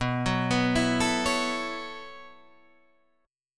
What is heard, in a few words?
B 7th Suspended 2nd Suspended 4th down Guitar Standard, chord; B7sus2sus4; arpeggiated; capo=2